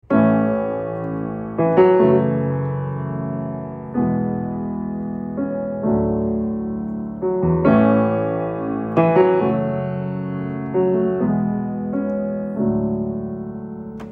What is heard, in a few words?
Piano